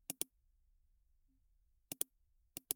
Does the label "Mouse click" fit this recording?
Yes